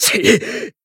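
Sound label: Voice damaged, Japanese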